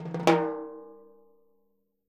TIMBHI S.1 R, Synclavier Percussion; ILIO; Synclavier Percussion 2; World & Orchestral

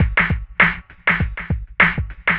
drloop, drumloops, Vinyl Attack; Masterbits

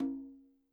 KILLER CONGA

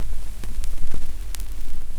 SCRCH NOZ, Record Noise

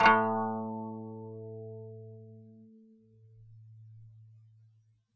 KANUN A2; SoundBytes; Early Patches; KAN CHOR BIG

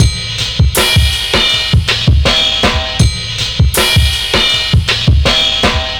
160, Drum Loops; Total Drum&Bass

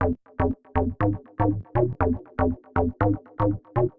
SH101 Bass Loop (120BPM), retro house

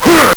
LSDj, Nintendo Gameboy; 300 Drum Machines

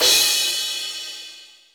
CRASH, ROCK CRASH

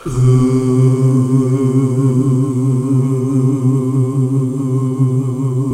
Gosp. Oooh; Gosp. Oooh 1; Sound & Vision - Gigapack II CD 1

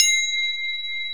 BEL, Orchestral Perc; Roland LCDP03

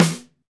SD Real, Roland; Sound & Vision - Gigapack I

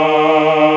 TRON MALES; E-MU Formula 4000 Series Vol. 3; Analog Odyssey; VK Instruments

